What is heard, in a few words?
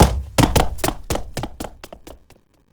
run away; Gaspen